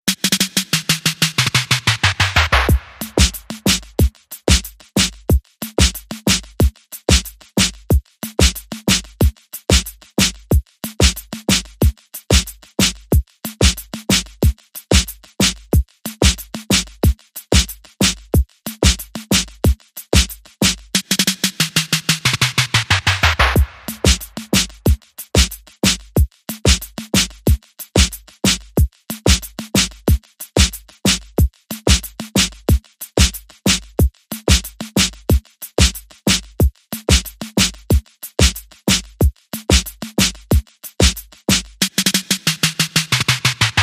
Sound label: Leather Beat